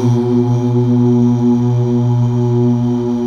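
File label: Male Uuh; Best Service Gigapack 1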